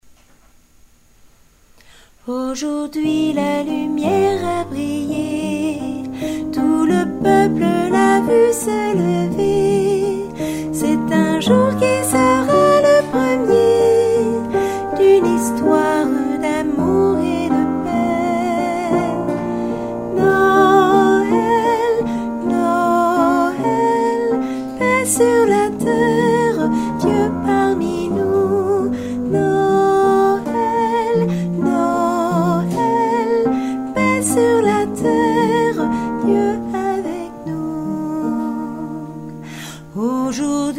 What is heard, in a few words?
soprano